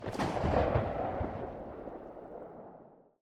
echo hills

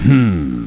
humm; BattleSNDS

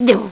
hit; BattleSNDS

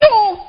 hit; BattleSNDS

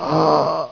DYING; BattleSNDS

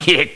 LAUGH; BattleSNDS

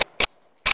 BOLT ACTION SPRINGFIELD, Weapons

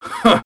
humm; BattleSNDS

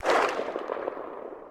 echo; AltSounds

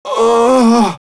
DIE; BattleSNDS

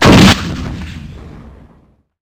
RPG-7

